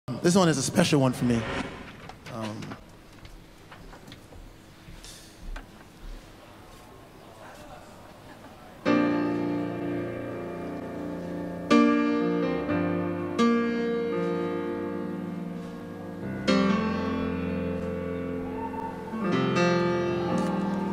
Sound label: Orchestra; Metropol